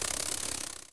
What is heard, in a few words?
Flap